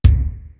boom bass; East West; Global Sounds

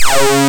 SAW SYNC; Analog Odyssey

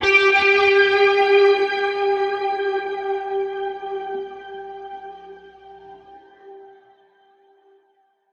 Guitar Notes G; Zero-G - Future Wave

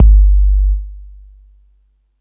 Lotsa Kicks, Drum Machines

